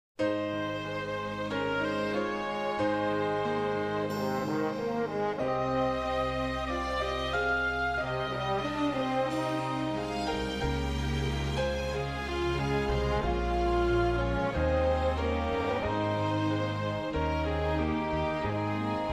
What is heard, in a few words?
Bass, O God Our Help, Choir Rehearsal Parts